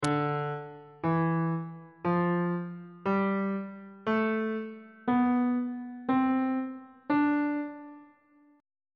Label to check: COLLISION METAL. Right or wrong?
Wrong